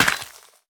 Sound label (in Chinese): 可疑的沙砾破坏声